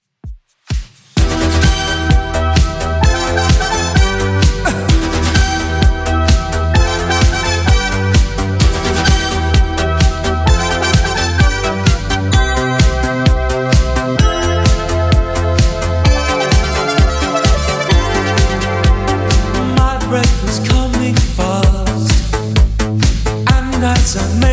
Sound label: 80s Redrum 129bpm